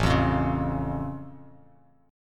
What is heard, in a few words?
A Augmented A down Bass Standard; strummed; JGuitar